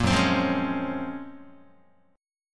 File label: F sharp Major Sharp 11th F sharp down Guitar; strummed; JGuitar